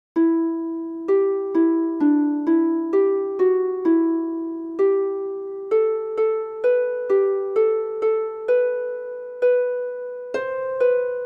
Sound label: Jah keep us steadfast in thy Word - HARP